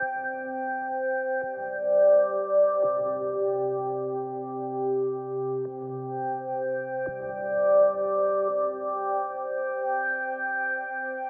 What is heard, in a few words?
Crackle Pad, пэды